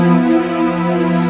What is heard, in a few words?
Maintain Pad, Jungle; M8